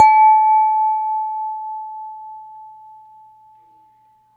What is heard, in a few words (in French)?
verre moyen; 96khz 24b mono; In the kitchen